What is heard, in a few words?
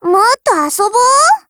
reloading; m4mod